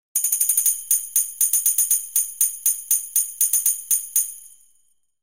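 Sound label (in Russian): короткая мелодия на бубне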